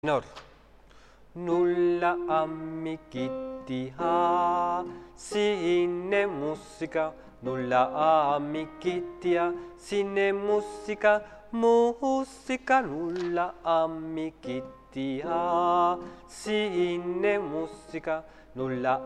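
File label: tenor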